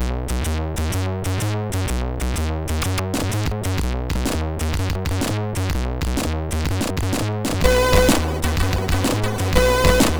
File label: sid MOS6581R4; Just Made It